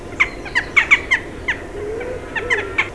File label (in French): choucas des tours Corvus monedula, chant